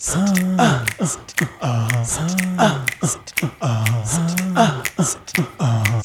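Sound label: 120 bpm, Vocal; Spectrasonics; Supreme Beats; African Contemporary; Beg Vocal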